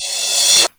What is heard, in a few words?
Crash, Yamaha DD-20